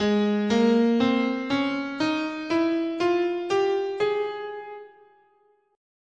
Ab Bebop Major, scale

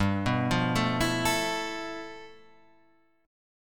G 13th G, chord

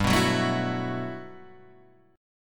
G Major 13th

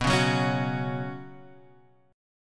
B Suspended 2nd B

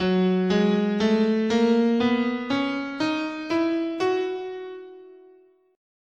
F sharp Bebop Minor, scale

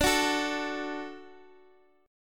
D Major D down Guitar Open D

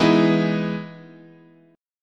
E Major 7th, chord

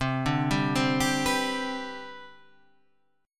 C Major 7th Suspended 2nd, chord

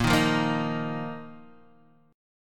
A sharp Suspended 4th A sharp

